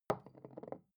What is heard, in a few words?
glass put down small wobble; objekte